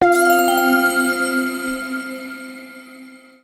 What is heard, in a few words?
SE SELECT GATE; KALEID